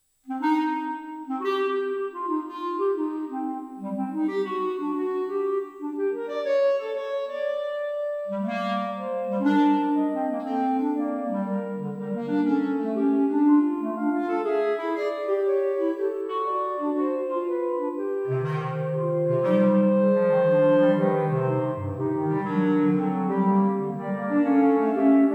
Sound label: bach blues